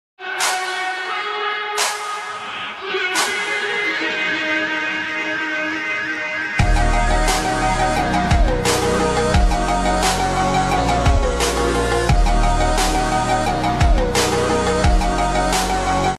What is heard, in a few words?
bgm ringtone english ringtone